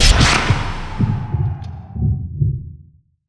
chain frost cast; dota; crownfall

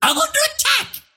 toy pug under attack; dota; pudge

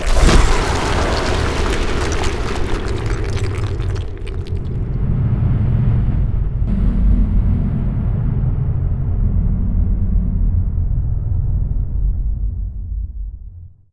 tombstone; undying

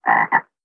frog; soundboard

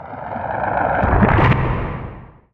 stomp channel layer; weapons; dota; elder titan

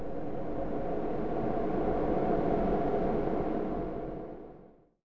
magic dire, ambient soundscapes; dota

